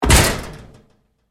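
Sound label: Door Locker Impact Close Up Hard, Metal Door; Doors; BigRoomSound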